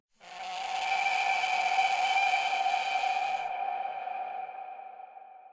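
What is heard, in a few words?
ambience bad creature screech; smite; conquest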